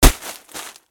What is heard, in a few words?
hit plastic; terrain